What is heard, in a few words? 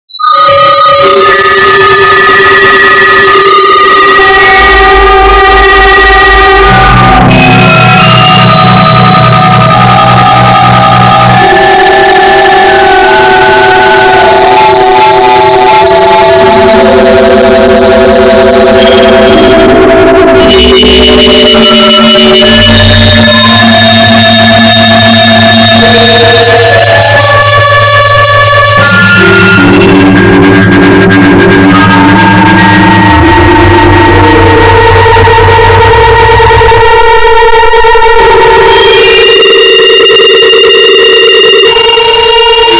noise